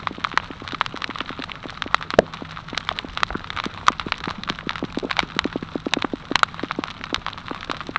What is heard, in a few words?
rapid popping, soniferous; Gilligans Island